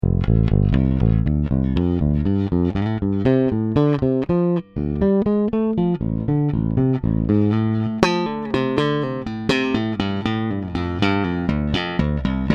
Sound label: ibanez jtkb 300 mittel pu; jetking